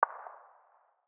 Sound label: very far distance, 5.56x45mm; tacz-tweak-pack; taczmechanics